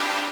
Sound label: lead